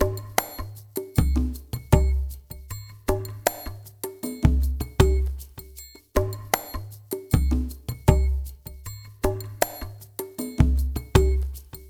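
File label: ICE FULL PERC, Liquid Grooves; Spectrasonics; Liquid Grooves 1 & 2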